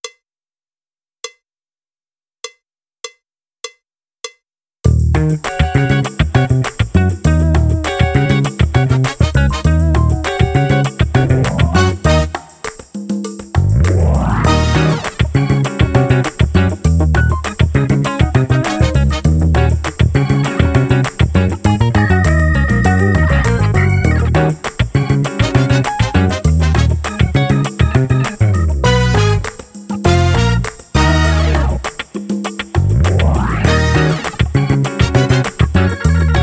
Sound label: Funk drumless